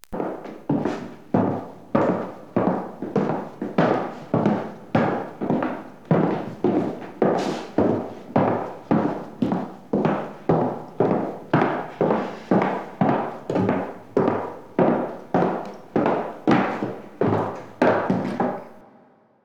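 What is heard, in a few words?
footsteps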